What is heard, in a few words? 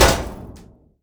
Physics Metal Vent Impact; Counter-Strike: Global Offensive